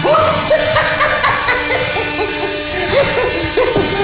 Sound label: Laugh; HLDJ